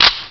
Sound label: reload